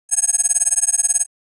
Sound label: Futuristic data